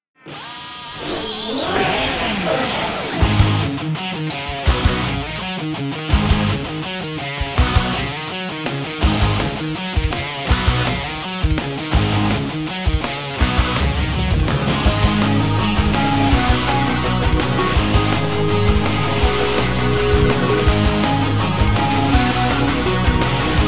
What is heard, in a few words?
Rock Theme; TeamLaserExplosion